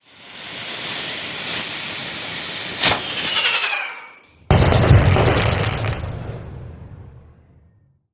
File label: fireworks; SnootGame